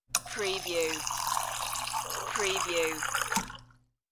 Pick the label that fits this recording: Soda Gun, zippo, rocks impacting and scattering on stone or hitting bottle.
Soda Gun